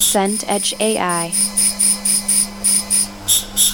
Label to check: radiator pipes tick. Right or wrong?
Right